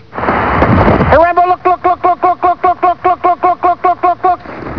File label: noise